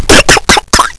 pain; openffa; bong

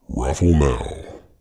roflmao

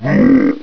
jump; openffa; enubis

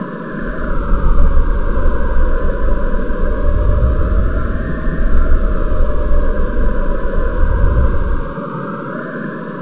wind wail

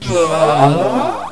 death; openffa; phantom

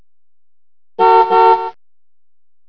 car horn

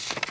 shuffle; gunslinger

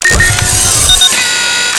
comp idle

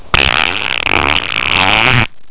fart

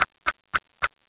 screw; baseq2

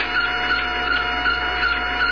engineering

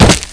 fall; zumlin

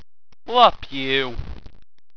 death

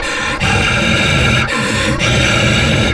hh sit down, guard; zaero; monsters

